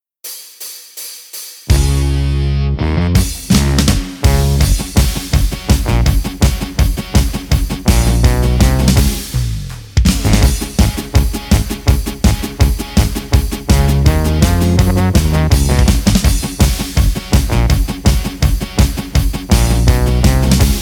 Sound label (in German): Hörprobe GIS CIS FIS H